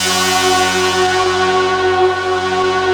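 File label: F#3, rough stick; Best Service; Advanced Media Trax Modern Composer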